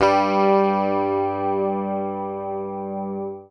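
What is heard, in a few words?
bass, G2, right channel; PICK; Sampleheads; Will Lee Bass Library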